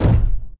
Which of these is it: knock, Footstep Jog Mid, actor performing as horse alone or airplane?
Footstep Jog Mid